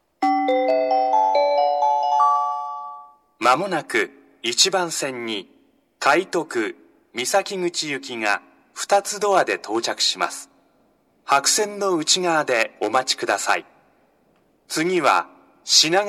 rapid express misakiguchi 2door, sengakuji station; tokyo-ctiy